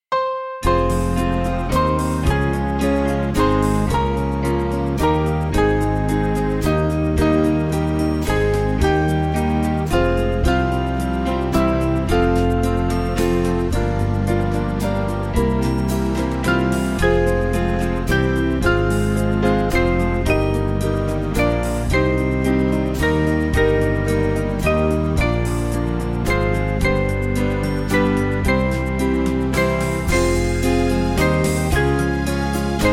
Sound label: Band